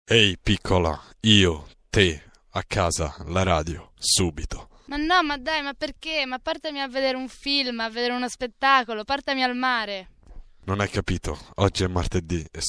Jingle; Rageonair

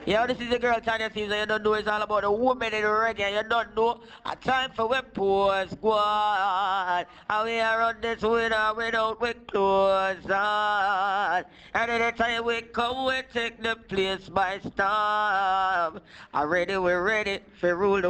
jingle

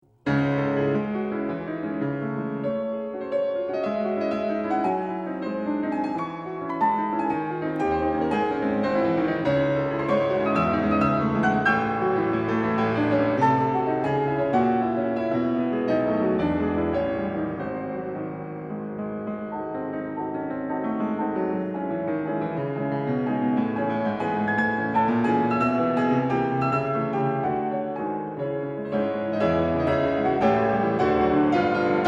Symphonic Etudes, Piano; Schumann; Op. 13 - Var. 3 (Aus Dem Nachlass)

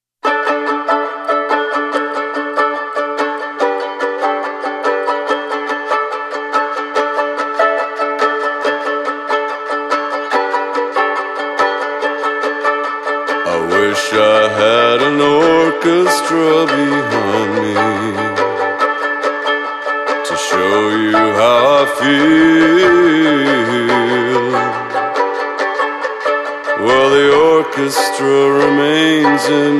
Ukulele

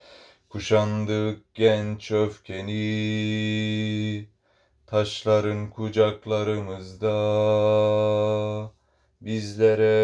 3rd voice (bass)

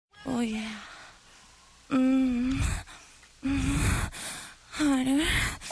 GIRL ORGAZIM, dj tools

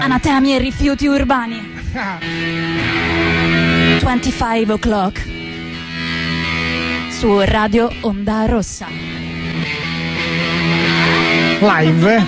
jingle; 25oclock

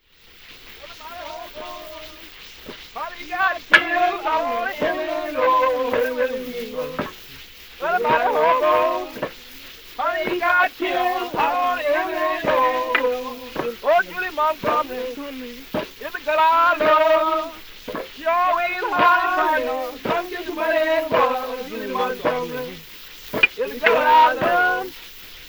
Male Section Group; Louisiana

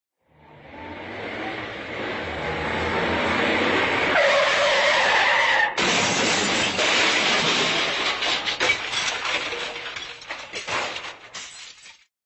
car crash sound effect

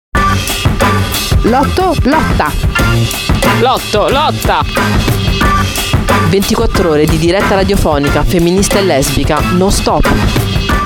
jingle; 8marzo

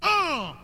"Oooh"

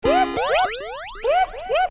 uh uh

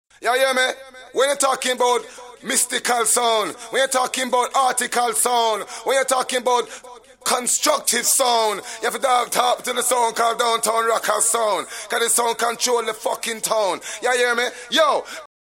jingle

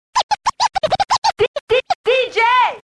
scratch

